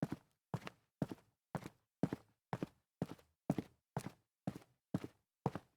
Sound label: walk, foley